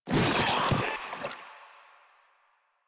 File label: Infested Ancient Attack; Enemies; Proto Infested Ancient; Warframe; Ancient Ninety Nine